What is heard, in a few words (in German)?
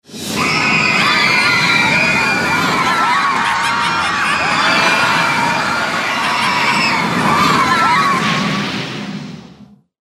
Atmo; BlueFire